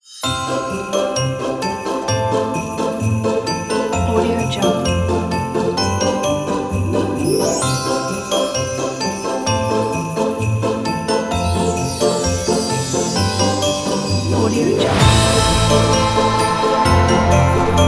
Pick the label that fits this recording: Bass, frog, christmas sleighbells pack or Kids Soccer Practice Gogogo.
christmas sleighbells pack